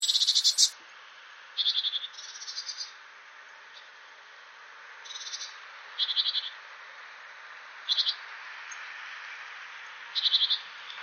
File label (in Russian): bolshaya sinitsa parus major, zvuki peniya ptits